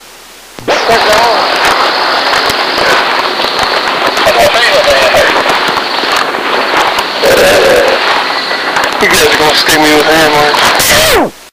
Growl

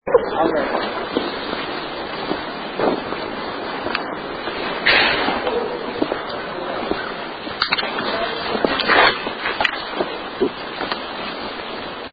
bathhouse walk thru, gate slamed very loud

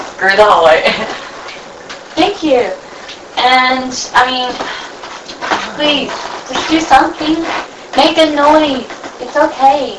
Unexplained Low Moan Amplified

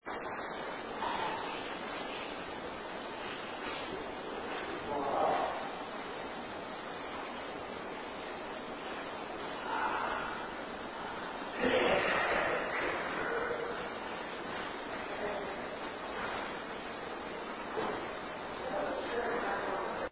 Art Room breathing; Fuel Building; 2nd Floor

